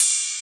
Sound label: Ride; Equipped Music; Loungin House